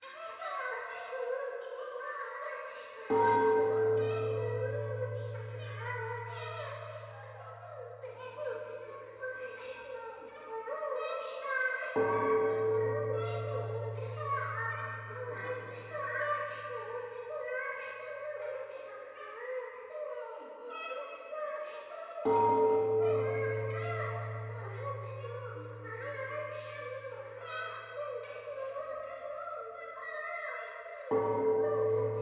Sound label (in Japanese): トイレ 可愛い喘ぎ 神社